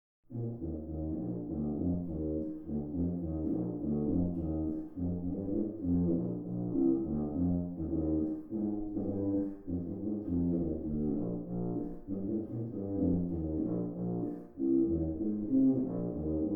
tuba

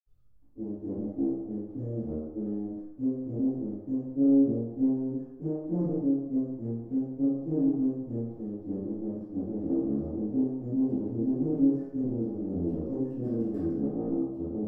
tuba

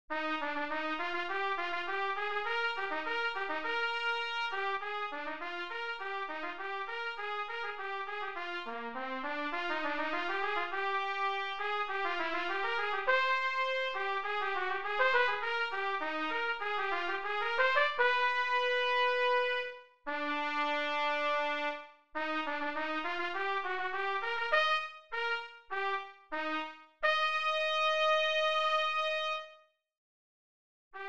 tpt

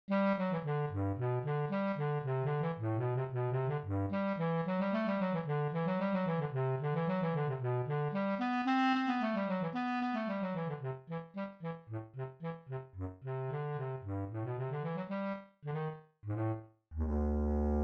Bass Clarinet

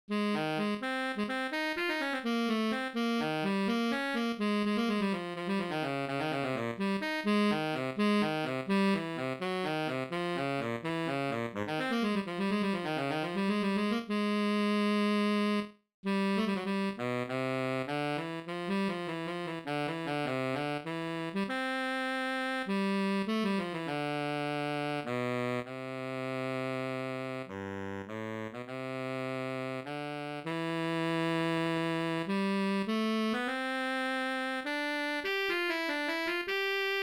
Sr Bari Sax

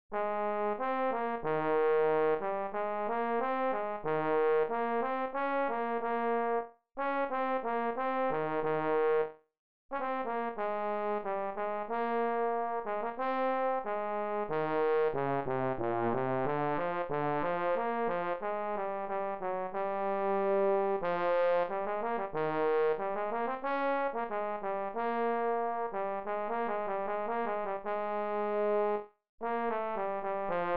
Baritone

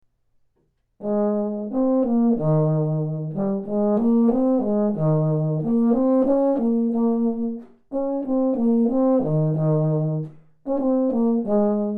baritone

